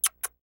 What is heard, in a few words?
kisses